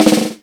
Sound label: SNARE ROLL; Greytsounds VOL-1